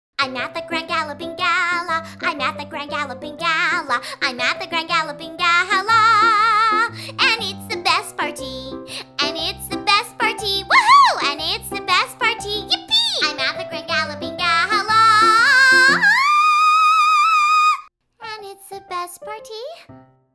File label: Piano; My Little Pony Friendship is Magic; Season 1